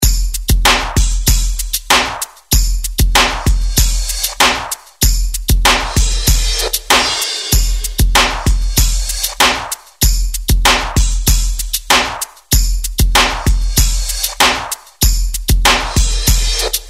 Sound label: thunder drummix processed